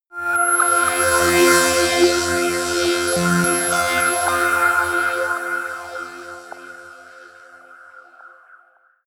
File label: sci-fi new galaxy, drones